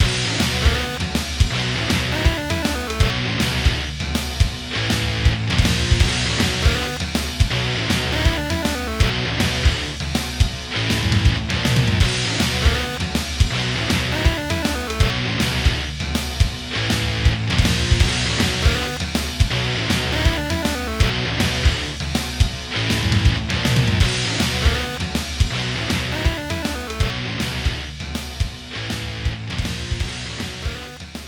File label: 3d Music